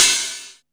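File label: HIHAT OPEN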